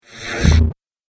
Laser Energy Bolt, laser gun; Laser Energy Bolt 02; Laser Gun Sound Effects

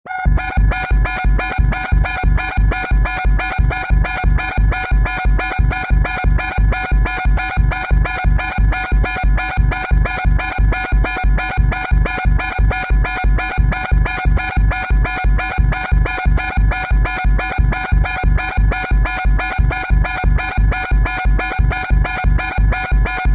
Alien Warning, Alien warning sound; Scary Tones; Sound Effects:Horror

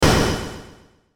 button burst; Buttons (Sound Effects:Multimedia)

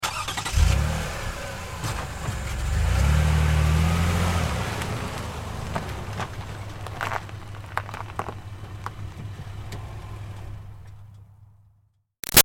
Car Highlander Start Up Short Dirt; 2005 Toyota Highlander V6; Cars; Vehicles; Big Room Sound

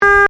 Car Ford Focus Horn Long; Car Ford Focus 20 09; Ford Focus hire car; Cars (Sound Effects:Vehicles)

